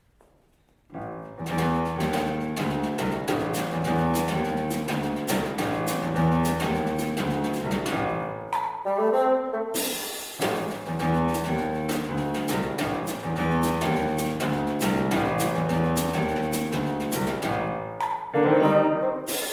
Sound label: swing